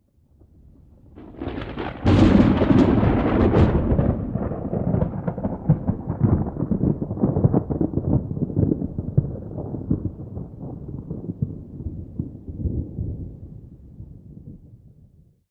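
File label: Thunder Crack Rolling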